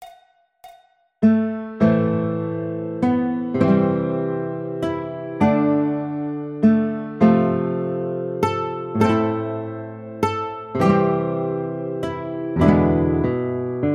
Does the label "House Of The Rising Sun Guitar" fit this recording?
Yes